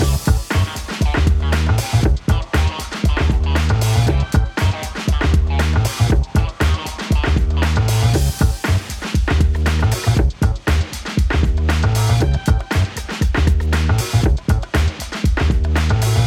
90 hiphop 118bpm; hot lava; ios macos windows

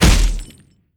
sfx punch; Animation Throwdown

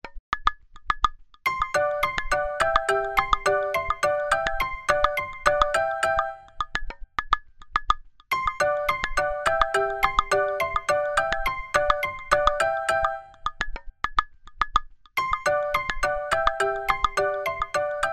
Board Game Stock Market (Crash); animal crossing amiibo festival

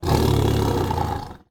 growl

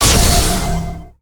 magic attack hit; Dash Quest; android

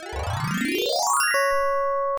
Teleporting; ShadowCaster; ibm pc at

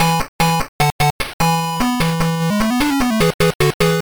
Battle Victory; Kamen Rider Agito Kuuga Wild Battle; Pico

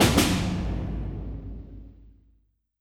Snare

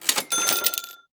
Cash register

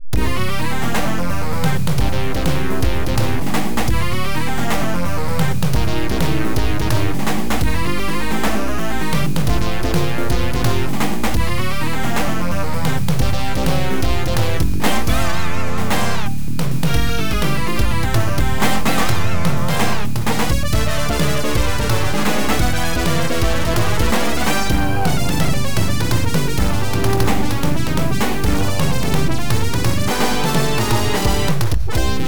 Sax; Party Zone; Bally; pinball; Safe Sax

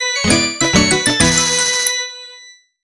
Jingle Game End Normal; Disney Fairies Tinker Bell and the Lost Treasure; Nintendo DS

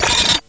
beep; Shattered Horizon

